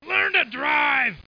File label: yell